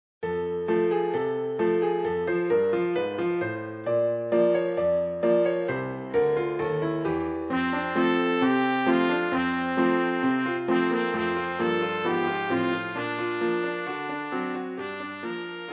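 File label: trumpet; Jingle Bells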